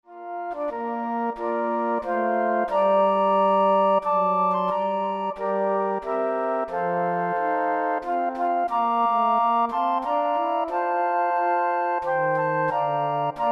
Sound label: Flute Ensemble